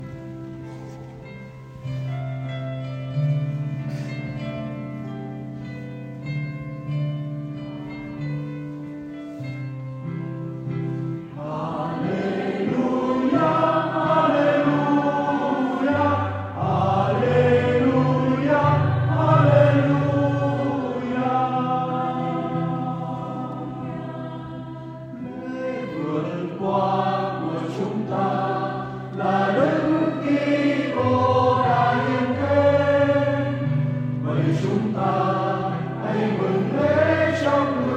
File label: Halleluja; ca doan teresa